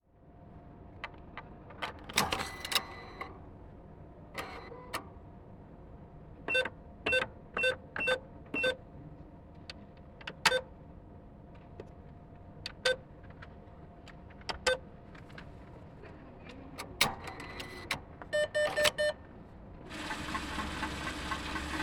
Cash Machine

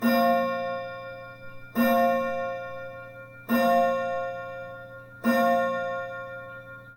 Old Clock

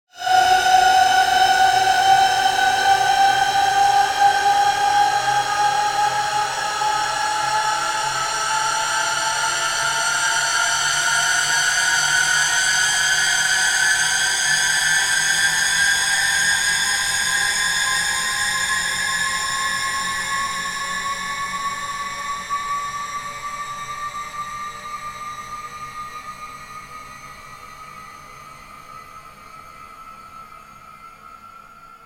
Metal Squeek Stretched Warped; Rutgermuller

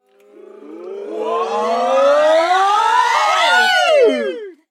Vocal Crescendo

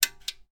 Light Pulley Switch On

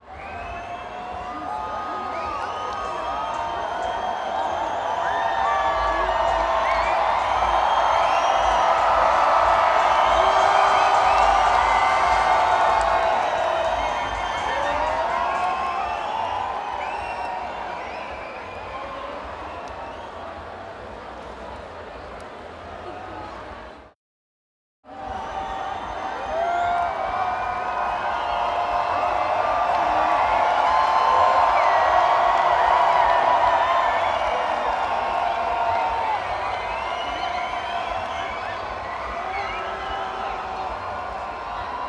Crowd In Anticipation Of Show

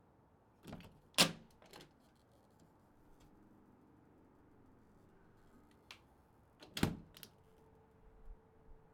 Screen Door

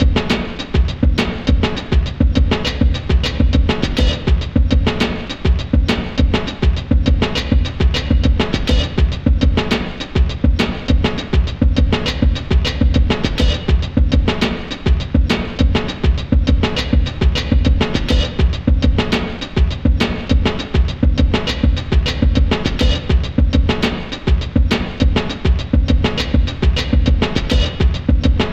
Messages Drums

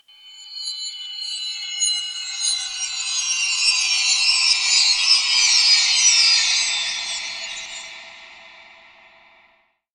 Horror Drone

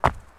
Foot Stone

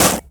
Fast Collision

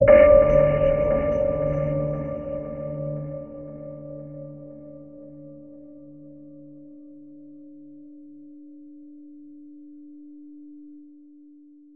Chime Atmos

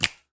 Snap

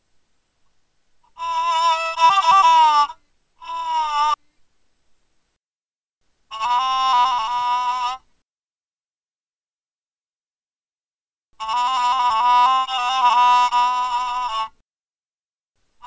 One String Phonofiddle

